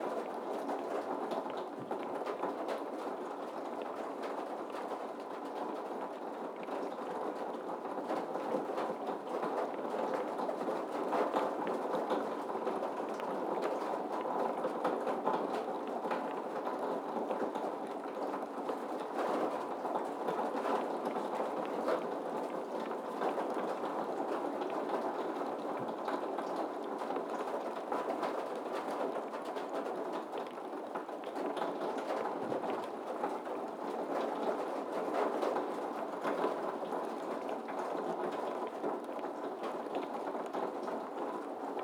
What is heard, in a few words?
ambience rain roof metal; Rust